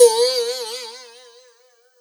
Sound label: MIXED PERC; 90 s Sample CDs